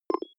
notification boxy tonal; signale

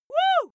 female cheer wohoo; schreie; menschen

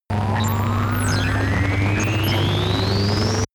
facility error charge, sciene-fiction

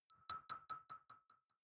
button echo resonance high; signale

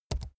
keyboard laptop key press fast soft; kommunikation; computer

